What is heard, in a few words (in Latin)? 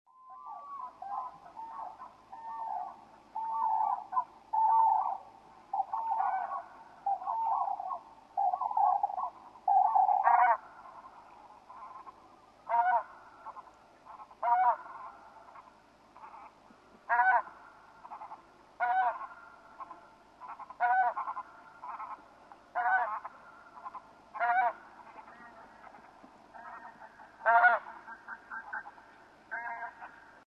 anser fabalis; Przyroda ptaki i zwierzęta